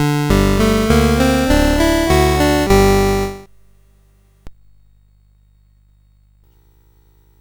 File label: Room Theme, CSG8580R5; Ultima III